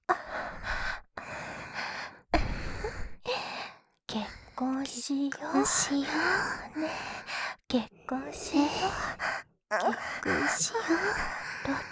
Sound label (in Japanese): 喘ぎ; シャルロッテ